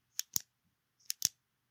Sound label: Pen Click